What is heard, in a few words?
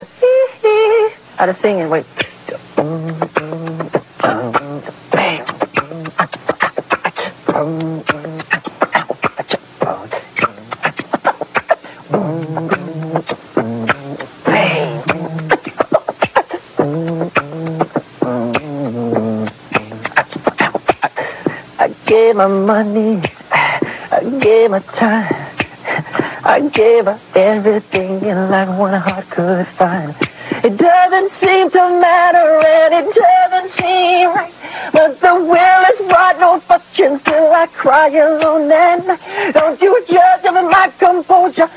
acapella; oprah winfrey